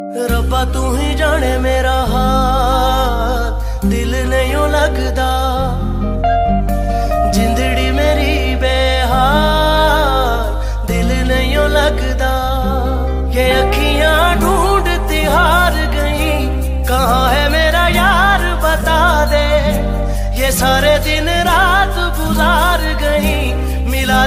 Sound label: sad song ringtone; bollywood